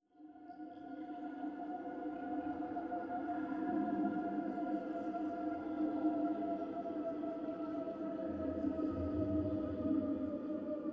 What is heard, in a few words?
falling star; ambient; Stellaris